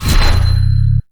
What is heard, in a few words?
demon health restored